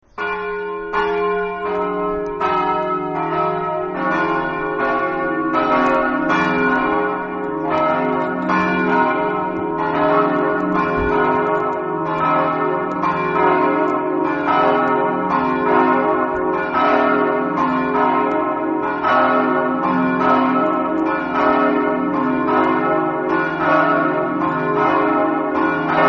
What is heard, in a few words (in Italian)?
muzzana, campane